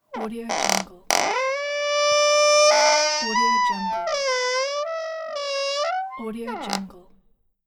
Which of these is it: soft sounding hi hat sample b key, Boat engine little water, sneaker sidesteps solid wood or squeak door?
squeak door